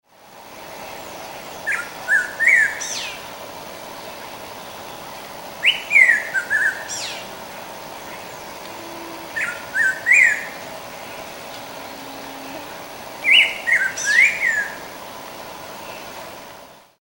Saltator fuliginosus; PN Itat; RJ Silveira

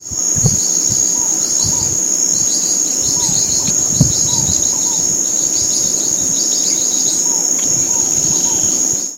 Forpus xanthopterygius; I Comandatuba

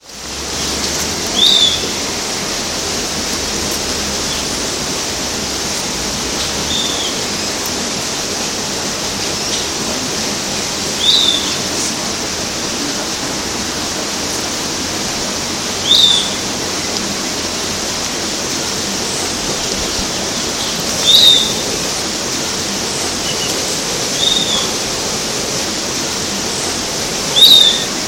Legatus leucophaius; Pco Anta Mongagua SP

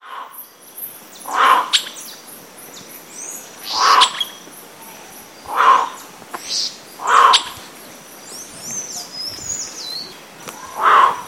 Penelope obscura; PN Itatiaia RJ; Silveira

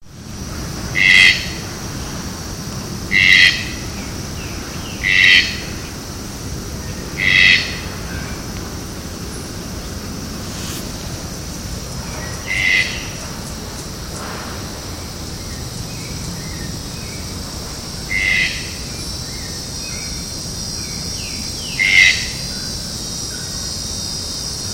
Ramphastos dicolorus; Jd Botanico SP

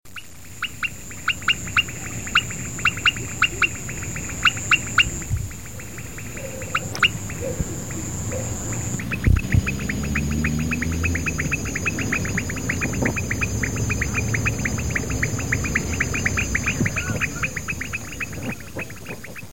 Leptodactylus podicipinus; Torres

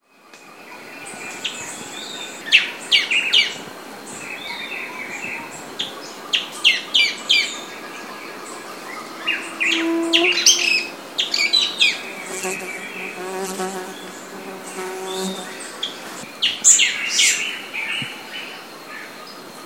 Neopelma chrysolophum; PN Itatiaia RJ; Silveira

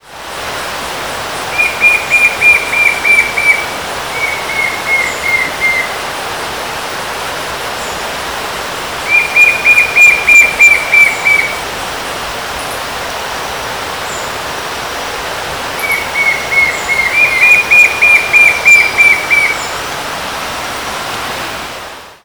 Pyriglena leucoptera trilha, SP

